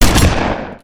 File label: fire, shotgun; halo; pump